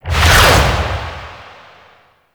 Charge; Warframe; Infested Lich; Hard Drive

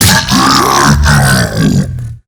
Hand Bomber Death; Warframe; MiTW; Battle Barks

